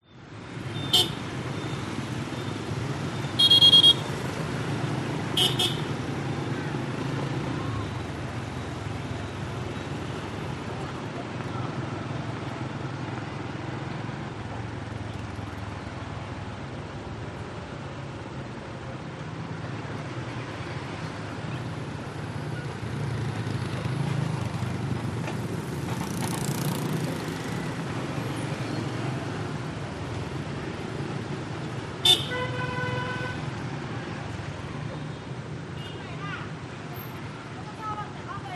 traffic motorcycles trucks cars hords busy hanoi vietnam